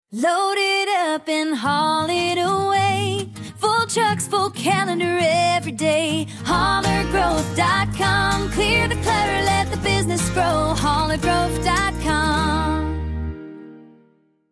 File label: jingle